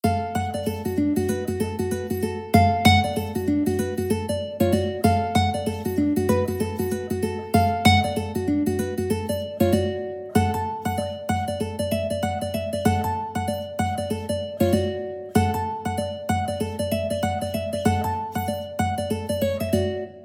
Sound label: Reel, mandolin